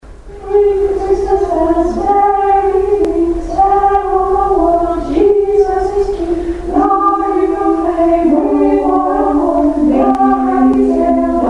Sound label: Ukrainian Bell Carol